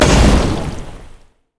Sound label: barrell roll boom; LoL; gragas